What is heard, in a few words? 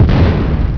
explod